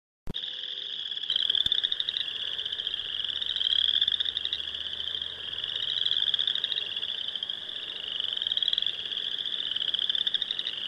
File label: western chorus frog